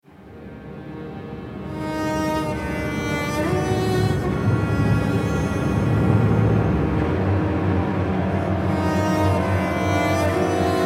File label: Cello BPM70, Horror